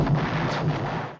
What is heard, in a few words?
Explosion; BSG FX - Explosion 03